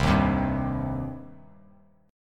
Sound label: C Minor C down Bass Standard; strummed; JGuitar